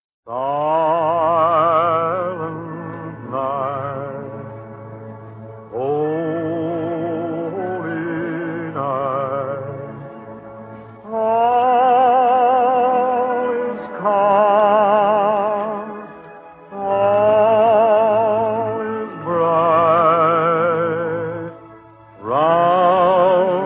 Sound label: Chorus and Orchestra Silent Night; 500 OTR Christmas Shows; V-Disc